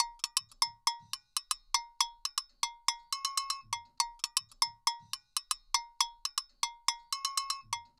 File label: Dance Bell Loop; Dance DJ; Percussion; BellCowbellAgogo; Pro Tools Essential Loops; Digidesign